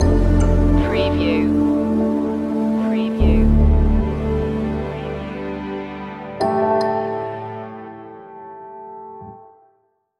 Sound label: pieces falling into place stinger; mysteries